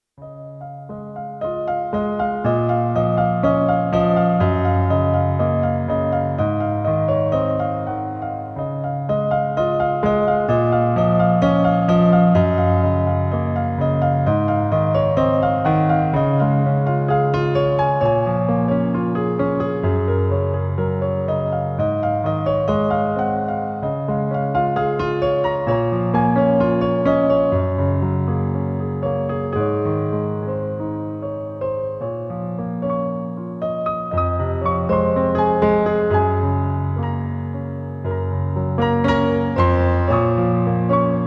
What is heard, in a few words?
For River, Piano